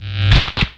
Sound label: BUZZ BURST, guitar; Spectrasonics; Bizarre Guitar; X-POSERS